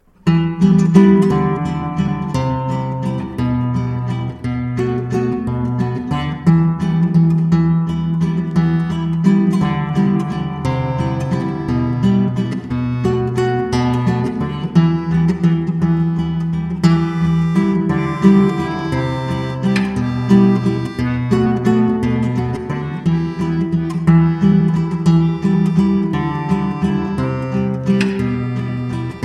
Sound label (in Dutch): Ruzie karaoke; musical de goede bakker